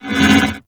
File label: Void Portal Rotate One Off; Warframe; Ambience; Orokin; Oro Sabotage Objective